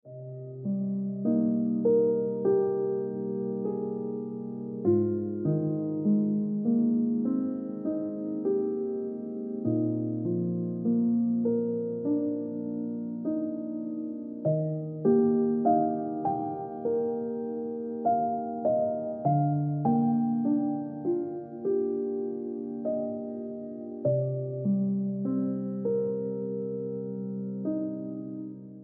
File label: Ringtone Piano Music